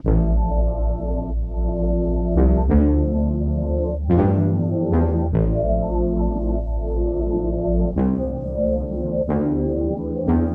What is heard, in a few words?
91 melodic stack breathing Fmin